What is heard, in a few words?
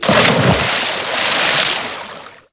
land in water